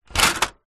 office printer paper tray plastic push in

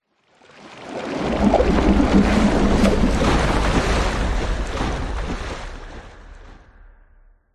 water whoosh impacts movements; george gazetis

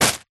packet spinach air inside set down; zapsplat

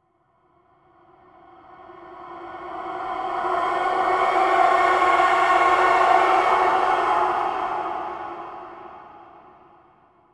Transition Vocal Flashback; bottlerocket

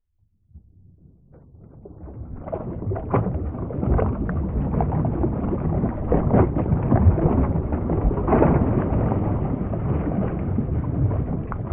water underwater movement; nature

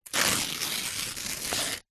foley paper glossy rip

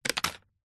leaf dry fall onto concrete

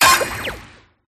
Fight Hits; PMSFX

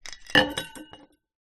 household flask metal down on table

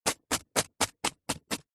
food celery raw cut knife dice

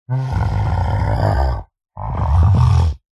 horror monster groan moan tired or ill

